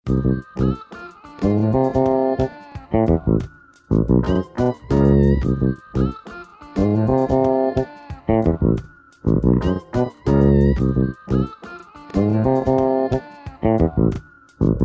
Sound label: 4 Am bpm 90; Tempo réel 2 mesures